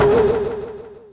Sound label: jump; xmasporky